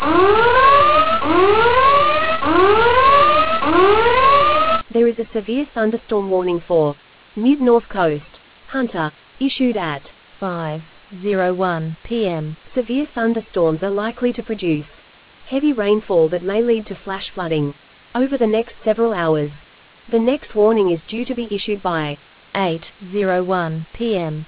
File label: storm audio